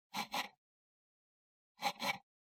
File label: PC Mouse Move Short